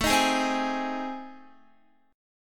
A Minor Major 7th Flat 5th, Guitar; AmM7b5; strummed; chordshape

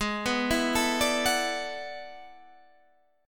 E 11th Ab

